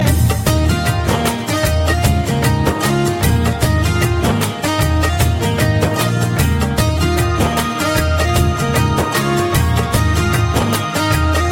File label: guitar tone; dabangg